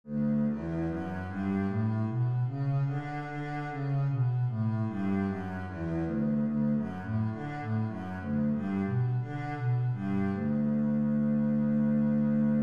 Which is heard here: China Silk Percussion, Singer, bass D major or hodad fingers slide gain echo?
bass D major